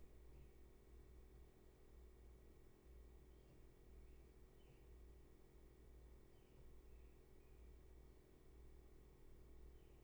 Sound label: Room tone